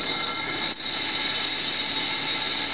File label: air; Aln501; OpenBVE; Train